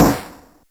RIM

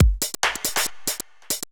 TECHNO BEATS 140

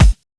Kick

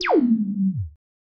SPACE FX 140; SpaceSounds

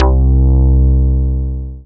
BASS MONO

